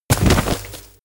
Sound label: bodydrop